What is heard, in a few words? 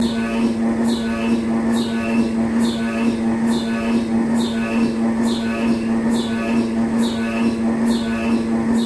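MRI tomography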